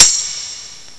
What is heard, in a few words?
tambo; robocop